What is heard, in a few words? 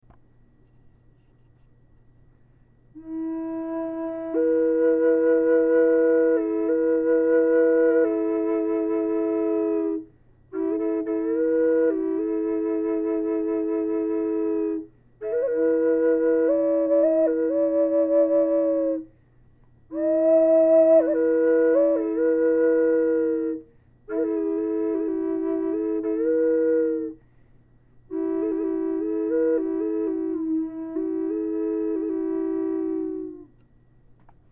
Low E Drone Cedar, flutes